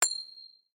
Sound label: Coin Flip Toss Ring